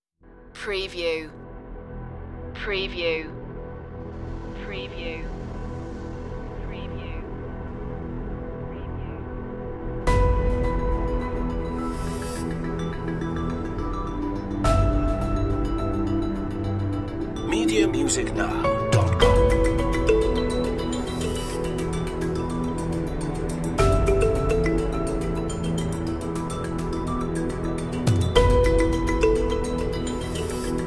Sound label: underworld series dramatic cue